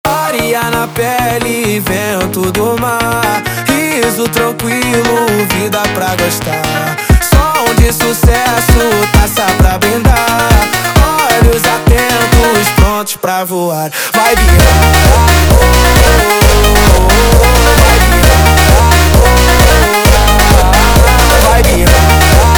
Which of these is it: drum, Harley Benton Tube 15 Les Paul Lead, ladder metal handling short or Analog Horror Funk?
Analog Horror Funk